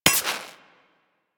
Laser Impact Metal; Surface

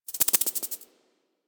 Exterior Forest Critter

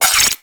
Igniter Pistol Mech; Warframe; Weapons; Corpus

